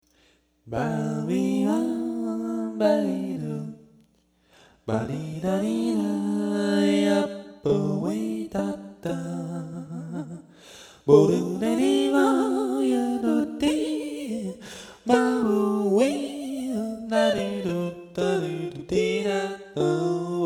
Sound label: pitchshifter